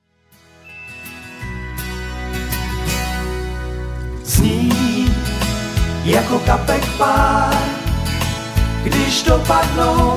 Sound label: VOCODE